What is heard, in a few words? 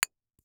Sports Ball Bounce And Catch On Cement